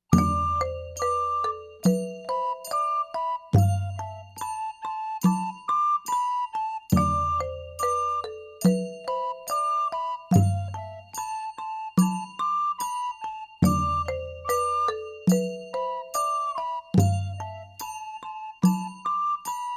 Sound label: Sathukan ensemble